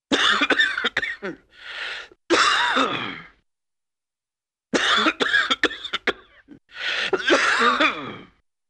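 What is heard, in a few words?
Man with wheezy Cough; Hollywood Edge; Cartoon Trax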